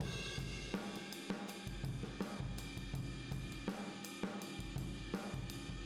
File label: Boogaloo Chorus Room M, 82 BPM